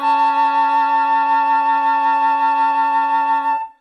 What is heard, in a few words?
flute and oboe; Notes; Winds